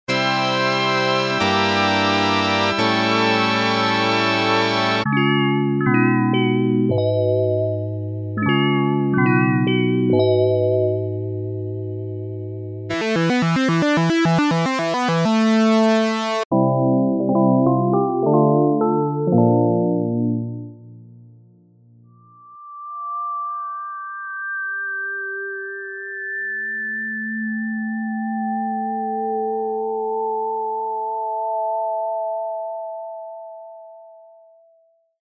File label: dw8000; obx